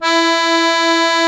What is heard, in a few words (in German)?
AKK VIOLIN E, akkordeon; Best Service; Alpine Volksmusik